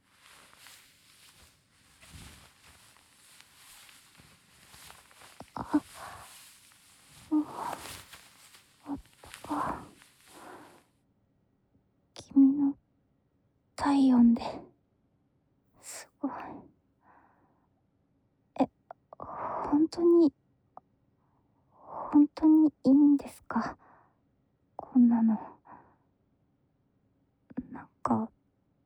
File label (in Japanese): 添い寝